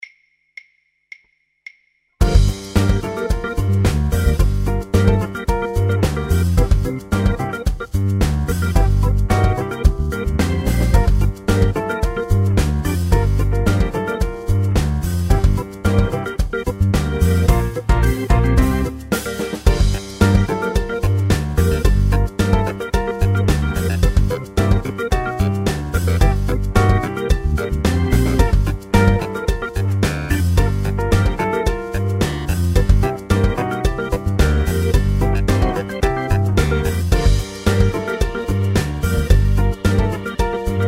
Improvising With Blues Scales Backing Track

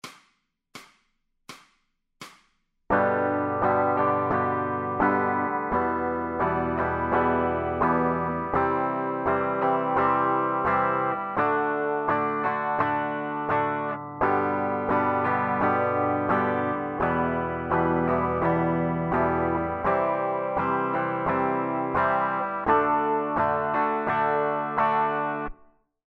Strumming Patterns For Guitar

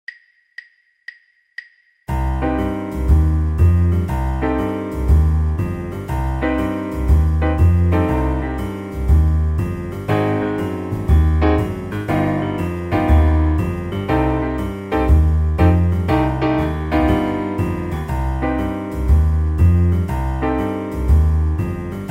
improvisation with jazz minor scale

